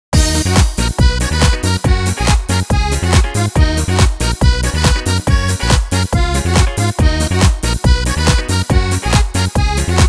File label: Dance